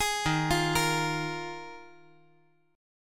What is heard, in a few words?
Dsharp Suspended 2nd Suspended 4th, D#sus2sus4 chord; arpeggiated; 13,15,13,13; JGuitar